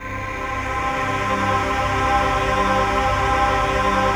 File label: PAD, Dance Mega Synth